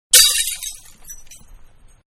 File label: Glass Jar Broken; Glass Jar-Being Broken; Glass (Sound Effects:Materials); Manhattan Production Music